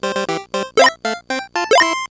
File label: Level Clear; Flipull; gameboy